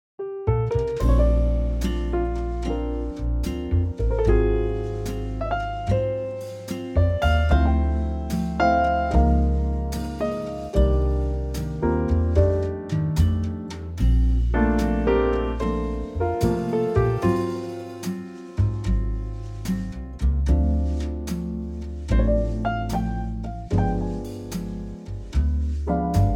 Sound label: Bb Quartet; key - Bb - vocal range - F to A; Wonderful Quartet arrangement of this sublime classic standard in a perfect tempo